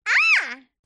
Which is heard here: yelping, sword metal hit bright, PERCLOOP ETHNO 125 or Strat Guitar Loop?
yelping